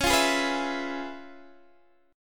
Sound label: Db 7th Sharp 9th Db down Guitar Open D